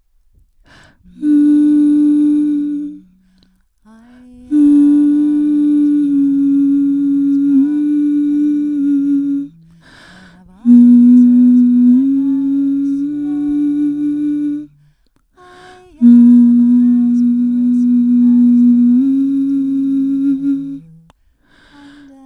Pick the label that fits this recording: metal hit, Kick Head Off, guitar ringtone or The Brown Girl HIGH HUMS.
The Brown Girl HIGH HUMS